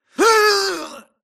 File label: grunt electro; Titanfall 2